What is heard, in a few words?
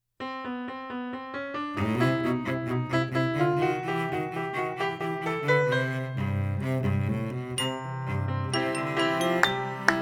instrumental